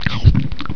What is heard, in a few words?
eat